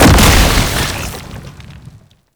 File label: Tank Infested Spire Emerge; Warframe; Lasrian Tank; Abilities